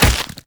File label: attack hit, zombie